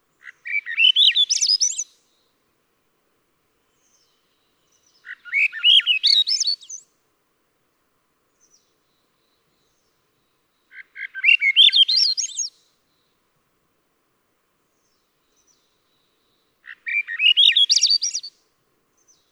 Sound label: Swainson's Thrush